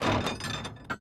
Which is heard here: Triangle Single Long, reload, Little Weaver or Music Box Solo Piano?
reload